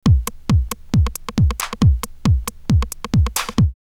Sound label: drumloops Techno House 136; X-Static Goldmine